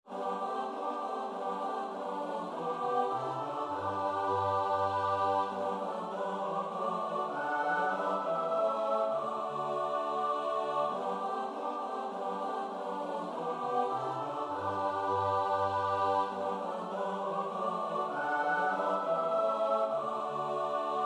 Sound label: midi choral; loewenstern; spiewaj panu nowa piesn